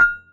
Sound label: cowbell low pass